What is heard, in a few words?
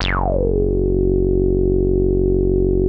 WET KISS; Masterbits; Vintage Voltage; SQ+FX